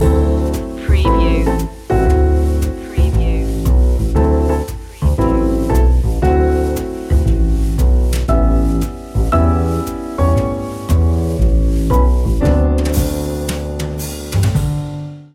silent night jazz

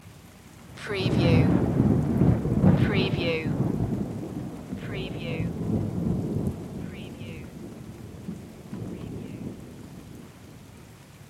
rain and thunder stereo loop

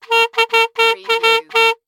Cartoon Clarinet Hits

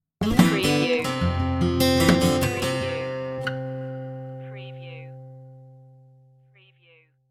sunny woods stinger

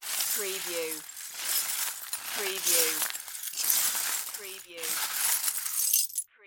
Debris Sweep Pile

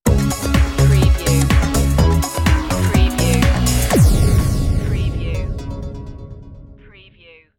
dancefloor stinger

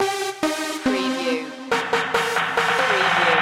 techno house promo loop

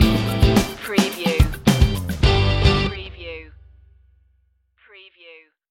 piano groovin stinger

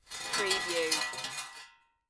Metal Rattle Grind Short

